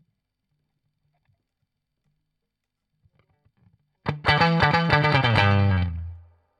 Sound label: Guit Solo, RNR for piano